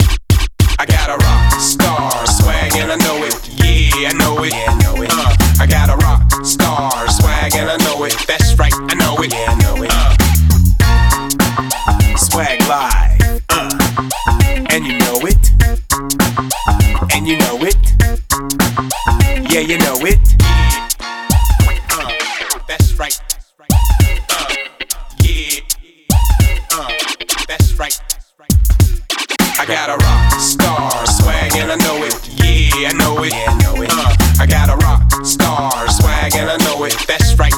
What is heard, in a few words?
100B